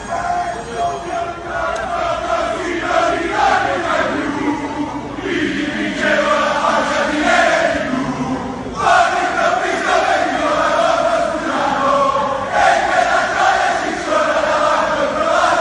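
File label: best pisa chants, football chants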